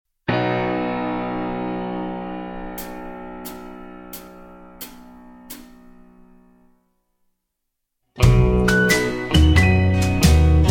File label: (B) No Backing Vocals